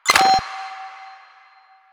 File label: Pager Open; Arthur Pager